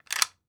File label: Weapon Gun Rifle Military M1 Carbine Clip Lock; AdobeCC